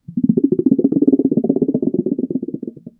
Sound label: plastic small ball smooth